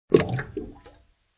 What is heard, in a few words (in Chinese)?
掉到水裡